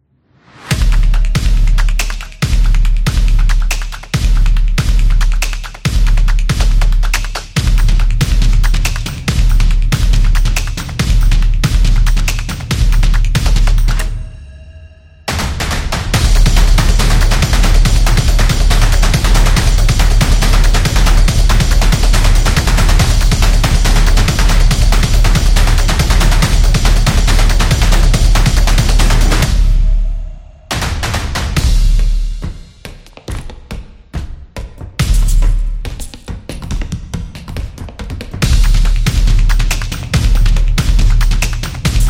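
Game Battle, Sport percussion background music created for action, competition; sports beat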